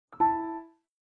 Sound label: Fold close; Nokia N76